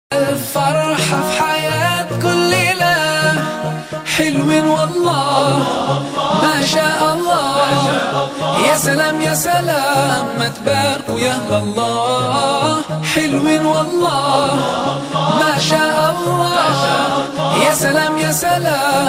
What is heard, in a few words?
arabic ringtone